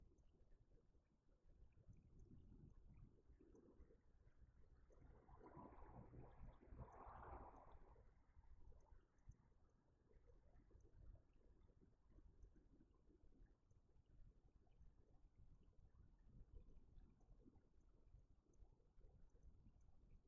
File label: Stairwell Static; poasttown elementary school investigation